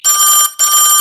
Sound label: Ring